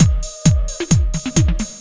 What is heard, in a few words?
Loop; Sound & Vision - Gigapack I